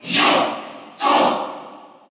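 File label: Cheer Russian, crowd; Mewtwo; SSBU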